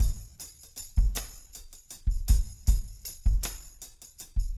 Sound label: 6/8 tam; Big Fish Audio - DOM